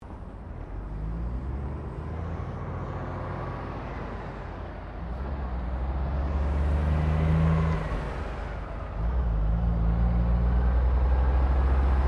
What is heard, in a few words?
Traffic Semi Trailer Turning Over Bump; General traffic sounds; Traffic and Cars